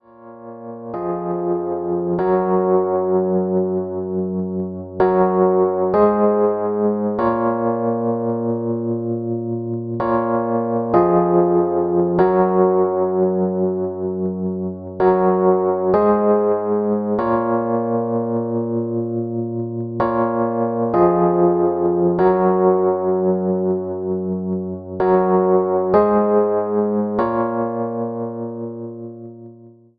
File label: Rhodes chord, hip hop chords; rhodes chord 02